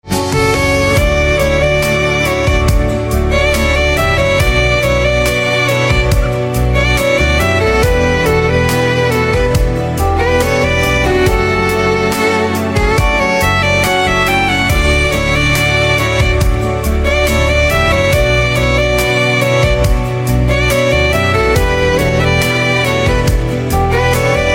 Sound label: Violin Instrumental Ringtone